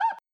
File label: punch